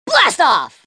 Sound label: blastoff